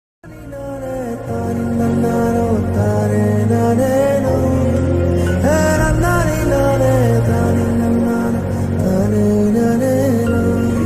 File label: Sad Bgm; KGf